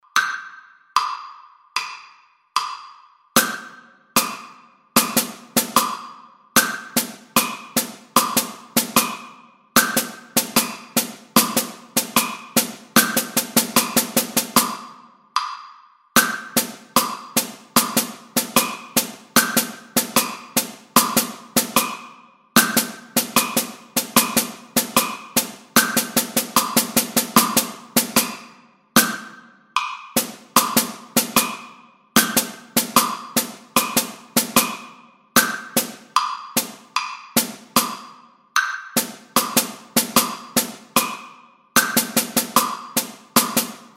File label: drum set